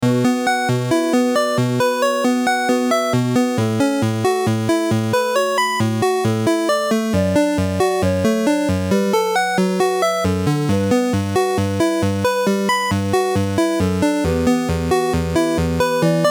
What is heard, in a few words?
Chiptune Loop